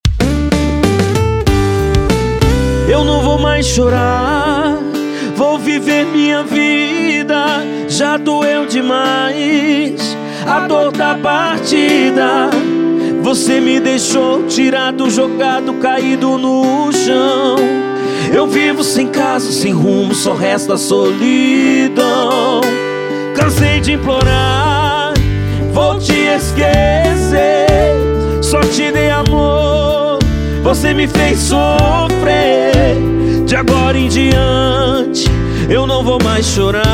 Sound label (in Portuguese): sertanejo universitário sofrência